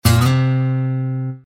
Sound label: Chimes